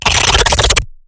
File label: Stealth Attack Start; Lurker; Evolve; Gorgon